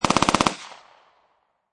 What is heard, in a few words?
Machine Gun Mp40 Long Burst; Guns; Lynne Music